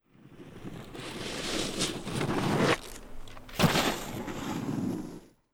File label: snowboard arrive and jump